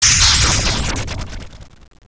magic

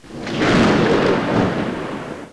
thunder; Mr. Wonder's Greatest Toyshop on Earth; OmniMedia

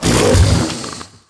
crocodile hit; DAoC

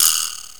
Vibraslap; TG300B

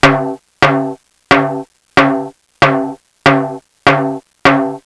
timbales slow; english; instruments; Pinnacle

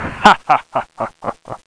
haha

